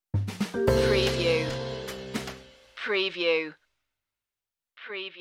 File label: jazzy stinger